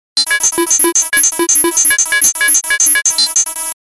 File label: robot dancing